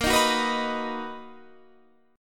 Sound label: B Major A sharp; B/A#; strummed